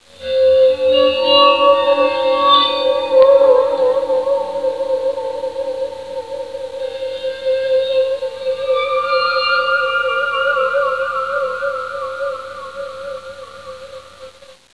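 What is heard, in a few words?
waterphone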